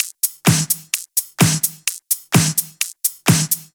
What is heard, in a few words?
Loop, 128 BPM